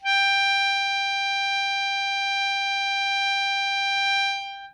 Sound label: hohner soprano melodica G4